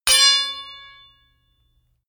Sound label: sword hit sweetener